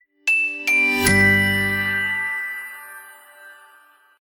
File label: Calendar Alert; eMobile; alarms